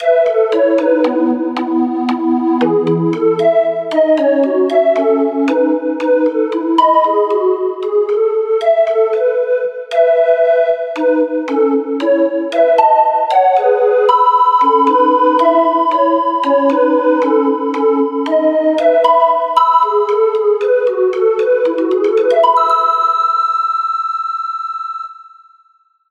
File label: Flutelike